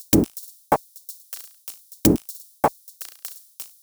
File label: Top 125bpm, House Drumloops; 90 s Sample CDs; Zero-G House Fabrik Drumloops; ZG Drumloops